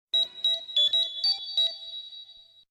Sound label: Communicator; MMPR